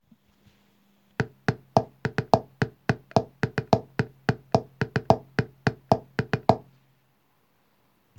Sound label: rhythm